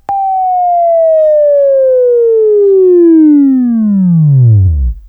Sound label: WAV Right, Sweeping; Sweeping Training program